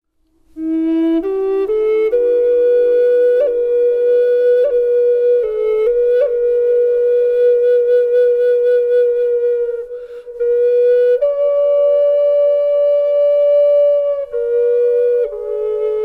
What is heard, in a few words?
indian, indian flute